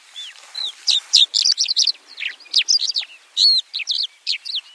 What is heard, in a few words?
bird sound; 鸟 bird; 图中为叫声美妙的百灵鸟; 小鸟